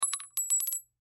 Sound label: Bullet Shell; Bullet Shell 7mm Drop Cement; Drops (Sound Effects:Objects); Blastwave; Warfare